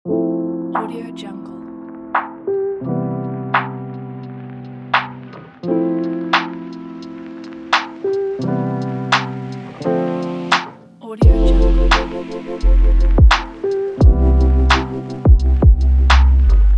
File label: deep lofi hiphop